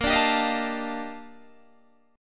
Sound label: Eb Major Sharp 11th Bb